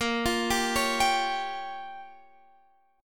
A sharp Minor 6th A sharp, Guitar Open F; A#m6; arpeggiated; x,17,17,14,16,14

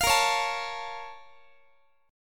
A sharp Minor Major 7th, down, Guitar; A#mM7 strummed; JGuitar

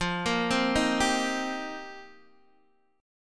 F 7th Suspended 2nd Suspended 4th, Guitar DADGAD; F7sus2sus4; arpeggiated; x,8,8,5,6,5

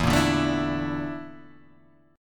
A sharp Major 7th Flat 5th E

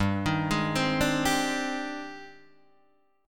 G sharp Major Sharp 11th G, chord

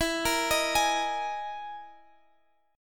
E Major 7th Flat 5th E, Guitar, Standard; x,19,20,20,21,x; EM7b5 arpeggiated; capo=3

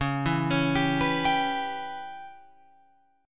C sharp Minor 7th Flat 5th C sharp Guitar Open F; C#m7b5 arpeggiated; 13,11,13,14,14,14; JGuitar

